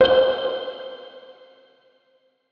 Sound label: One Hit Sonar Inspired